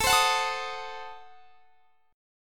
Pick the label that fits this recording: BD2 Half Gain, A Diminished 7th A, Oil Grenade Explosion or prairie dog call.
A Diminished 7th A